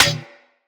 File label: Melee Staff Impact Generic